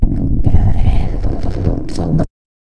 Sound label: jingle